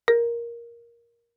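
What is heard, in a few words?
Marimba yarn fortissimo Bb2; MIS Pitches - 2014; Percussion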